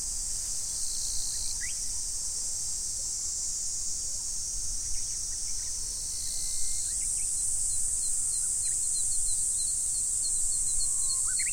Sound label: glassland; nature